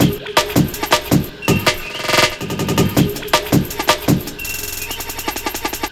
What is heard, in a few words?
Break, Loops; M8